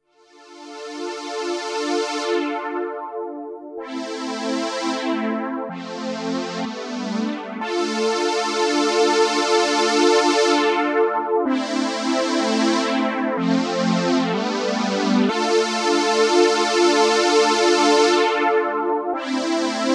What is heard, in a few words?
Mini Prophet Strings